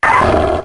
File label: cry